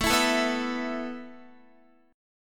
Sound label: A Suspended 2nd A Guitar; Asus2; strummed; x,12,14,14,11,11